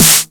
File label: SD, korg drums; Korg Electribe; ESX-1; electribrary-3